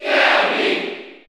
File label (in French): Cheer French NTSC; Kirby; SSBU